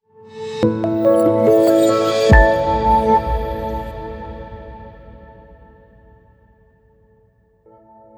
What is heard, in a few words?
Elegant Logo